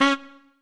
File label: Trumpet melo